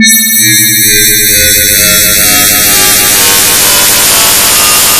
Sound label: beam